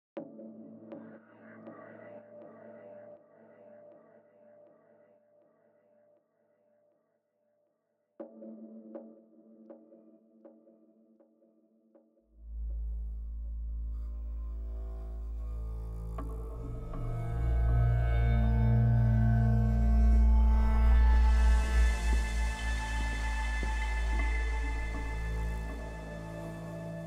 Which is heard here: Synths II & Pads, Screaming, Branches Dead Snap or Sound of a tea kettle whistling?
Synths II & Pads